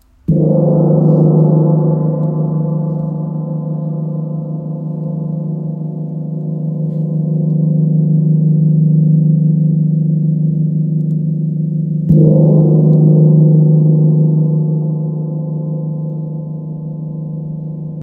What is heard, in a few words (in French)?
Gong Nepal 66cm son